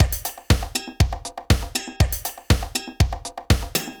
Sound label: Beat, Old Skool House, 120bpm; House of Amnesia; Big Fish Audio; OSH Construction Kits